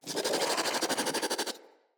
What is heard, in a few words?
Infested Crawler Attack; Crawlers